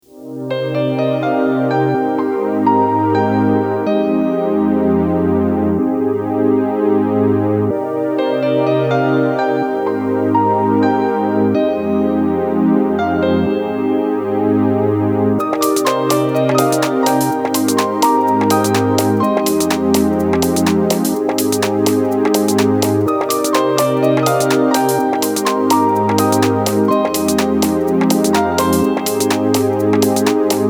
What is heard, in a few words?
Counterpoint example loop